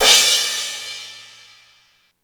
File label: Crash ext